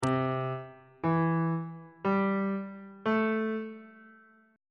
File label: c major 6th chord intervals on bass clef